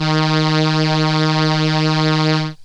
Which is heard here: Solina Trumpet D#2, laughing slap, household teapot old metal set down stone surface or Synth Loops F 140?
Solina Trumpet D#2